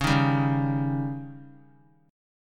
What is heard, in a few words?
Db Suspended 2nd Flat 5th; strummed; JGuitar